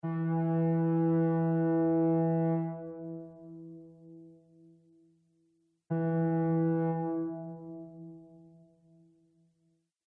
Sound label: Ship Horn 2 Distant Sounds; Boat Sound Effects